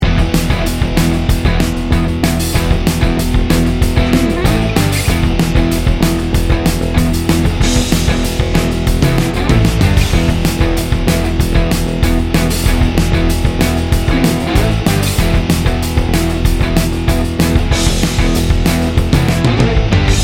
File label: rock fight loop